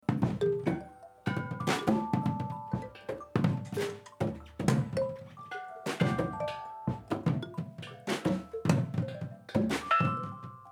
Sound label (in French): Improvisation